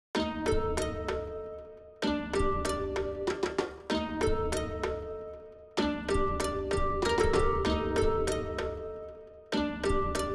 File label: Ethnic Arranged